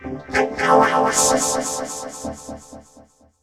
vox, Vocal FX; neuro; Sinistarr

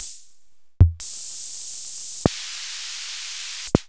SoundMaster SR88

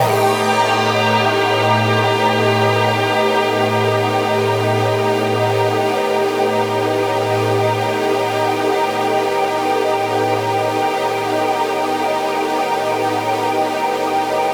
Long Wholetone Pad

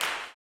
CLAP, Blues Soul; Spectrasonics Vocal Planet

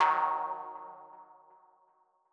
Mono DBeat D#3; KG MegaSaur

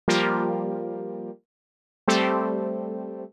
120 Pad Chords C, Keys, Nu Jazz House; PowerFX

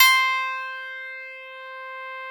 CLAV C

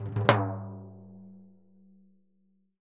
TIMBLO SHO R, Synclavier Percussion; ILIO; Synclavier Percussion 2; World & Orchestral

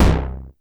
arpeggio; Masterbits; Vintage Voltage; SEQ-FX

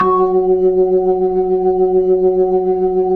B-3 F#4, Jazz; Keyboards of The 60's and 70's

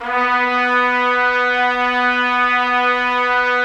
Trumpets; Roland - LA Composer vol. 4

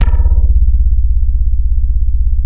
BASS, Drum & Bass, 160 BPM; Ueberschall; Drum & Bass Resonance

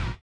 collision; Spectrasonics; Metamorphosis

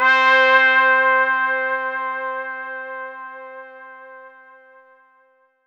C5, Synth Line; 90 s Sample CDs; NiceBeats; SynthLine VOL-24

